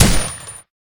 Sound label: SCAR H